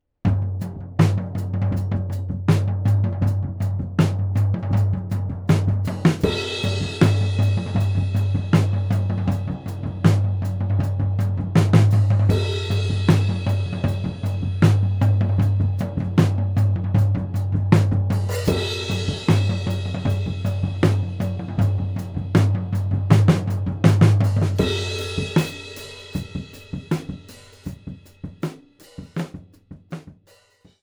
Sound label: Drums Oveheads Only Rolling Floor Tom